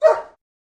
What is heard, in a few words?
Shepherd Dog Bark Ind